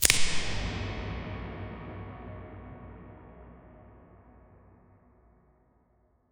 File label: create a sound with stron, lighting